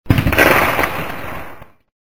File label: Gun Shot